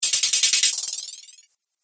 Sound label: Magic Shimmer Spell; Magic Sound Effects